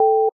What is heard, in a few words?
Dtmf pound Loud; Skype Sounds